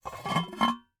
Cookie Jar, ceramic, glazed, irregular shape, hollow base, hollow top, rough, resonant, tonal, open; fast; Eiravaein Works; Vaeyan IV